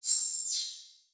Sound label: Multimedia Internet CD-Rom Flash Element